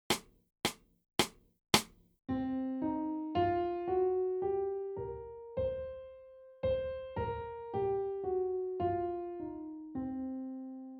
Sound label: BLUES SCALE